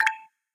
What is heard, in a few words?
message